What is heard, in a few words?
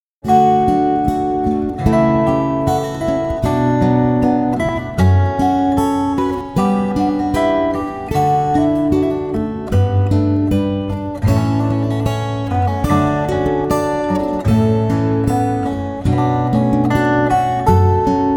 Cannon in D guitar